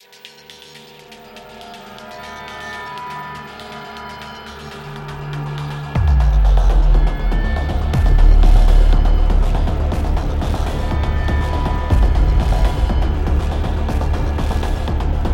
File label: Suspenseful